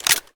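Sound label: deselect, groza; svencoop; ins2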